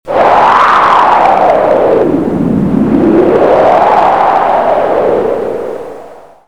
Vent violent; bruitage; Climat

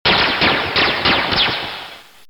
Combat sabre laser

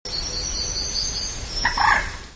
Ambiance jungle; bruitage

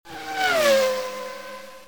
Formule 1

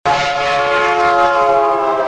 Klaxon en ville

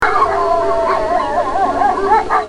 Meute de toutous nocturne; bruitage

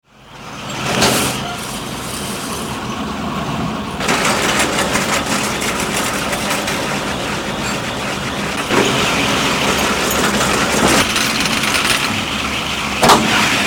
Teleski, Ski

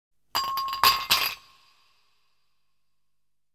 Glaçon tombent ds un verre; bruitage; Liquides

